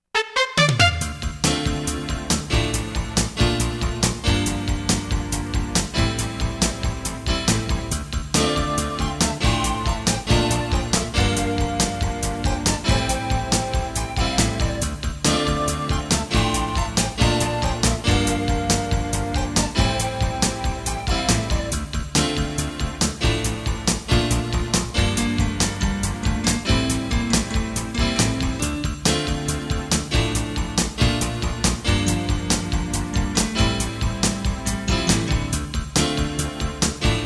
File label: Weather Bed